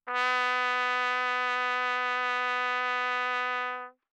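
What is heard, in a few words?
Trumpet vibrato ff B4 stereo, Bb Trumpet; MIS Pitches - 2014; Brass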